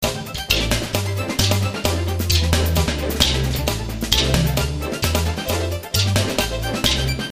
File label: Fusion 132 Dm7